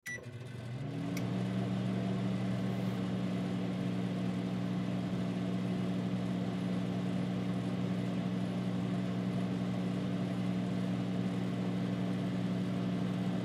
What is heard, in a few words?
Household Microwave Turn On Run Off Beep Appliance; Appliances; Blastwave